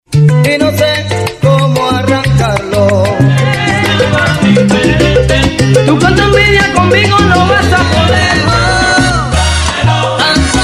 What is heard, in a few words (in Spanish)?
salsa pa la calle